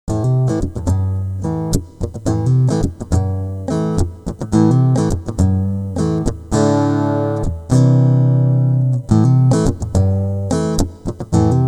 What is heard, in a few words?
takamine